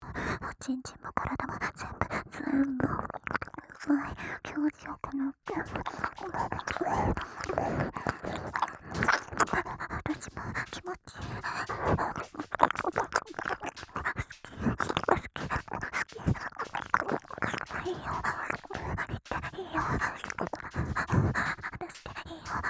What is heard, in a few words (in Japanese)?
耳舐め騎乗位でお耳も股間も幸せに; 快楽ボイス研究所; ノリの良いボーイッシュな女子が俺の部屋でエロくなる